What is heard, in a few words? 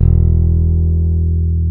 E-1 I-BS, bass